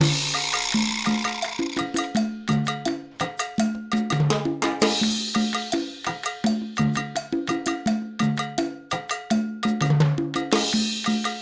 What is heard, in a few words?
Acoustic Percussion